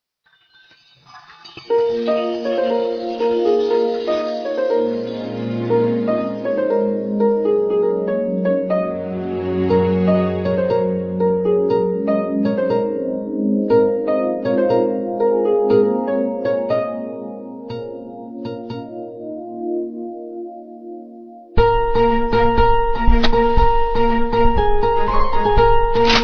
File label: love bgm ringtone, heart touching love bgm; oru adaar love